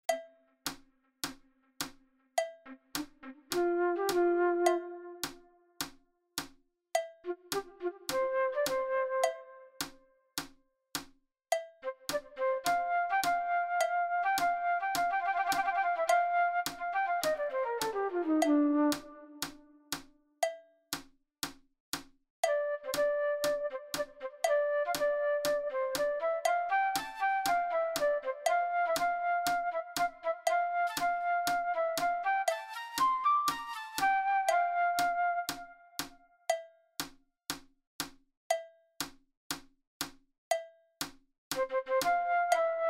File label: flute fast; Uebungs-CDs; Playbacks